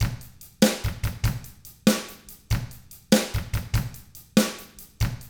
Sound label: ROCK 6/8, Drums; ILIO; Double Platinum Drums 1